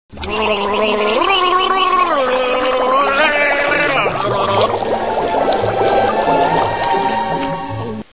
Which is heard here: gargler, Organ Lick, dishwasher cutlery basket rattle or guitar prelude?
gargler